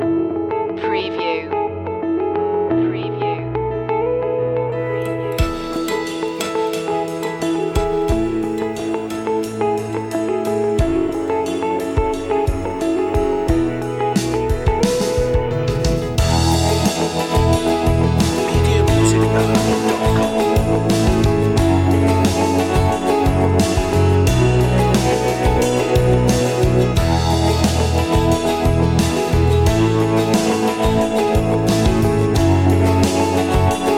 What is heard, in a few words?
acoustic driving day